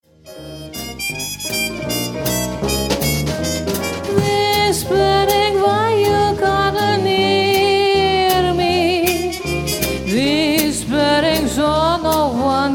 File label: whisperin